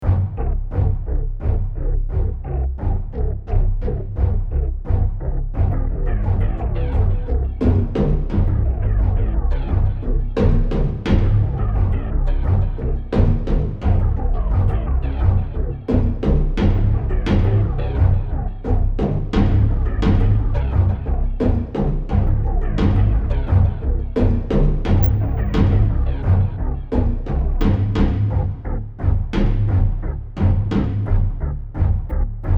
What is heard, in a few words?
Chase Pulse Faster, Cinematic